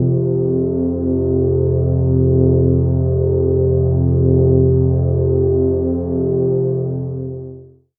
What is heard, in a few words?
pad c3